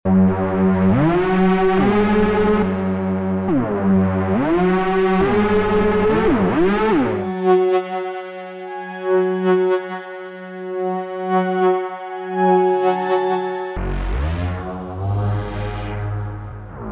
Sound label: analog journey; oberhausen